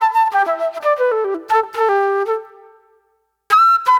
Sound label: Soul Plate Flute